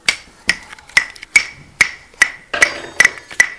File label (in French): claves